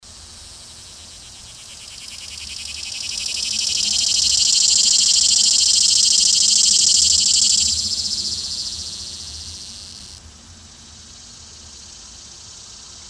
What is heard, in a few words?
T. chloromera, Cicada